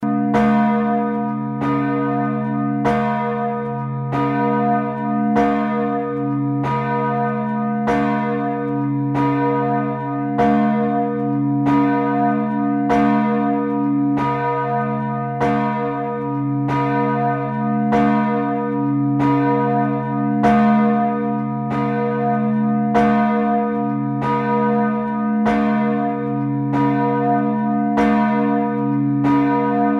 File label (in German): Glocke